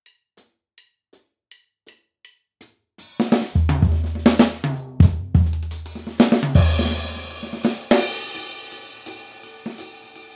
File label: swing